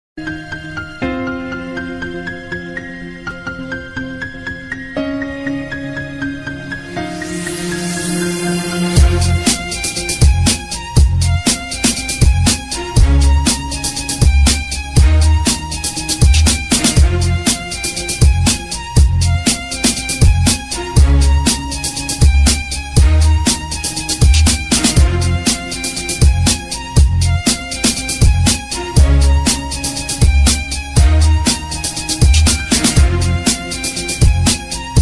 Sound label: punjabi love song ringtone